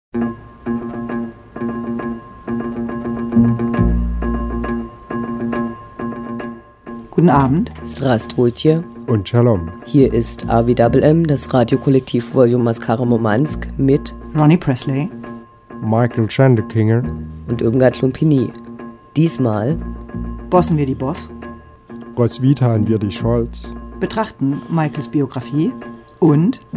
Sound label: Jingle